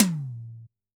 Tom, drum

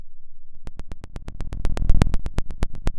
Sound of a fire crackling

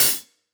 close Cl Hat, drum

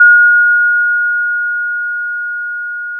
Sound of a school bell

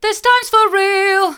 this times for real hard, vocal

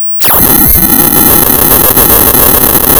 Sound of tearing paper, center

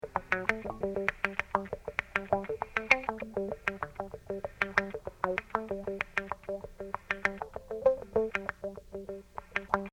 loop kicking

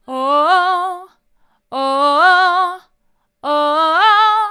oh oh oh mid, vocal

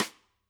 Sd St, drum

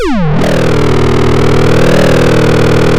pop, chainsaw

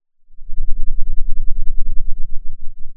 pop, Sound of a thunderstorm

